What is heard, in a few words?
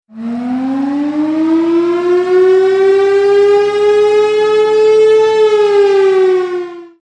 Tornado Siren